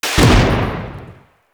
Pistol Tail; Guandao

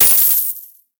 Fist Claw Coin Impact; Incarnon

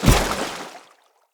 Step Water, Footsteps; Characters; Enemy; Mendicant; MndKing

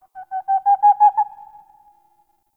Forest Owl; Warframe; Grineer

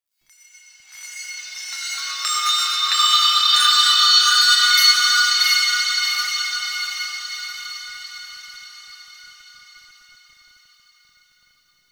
Metal Chimes; Warframe; Ambience; Nokko Colony

